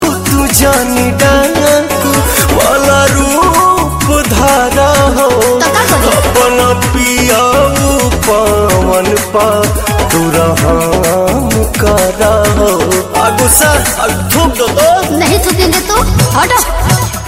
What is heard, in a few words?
Bhojpuri Song DJ Remix